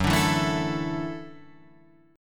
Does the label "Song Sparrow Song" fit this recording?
No